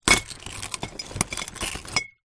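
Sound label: broken glass bottle pieces crush grind; zapsplat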